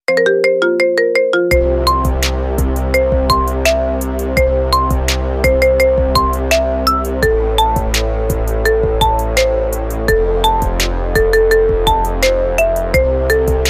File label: thunder marimba ringtone; android